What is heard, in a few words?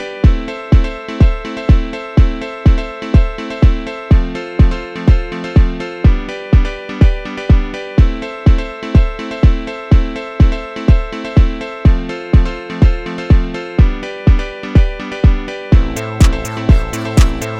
electronic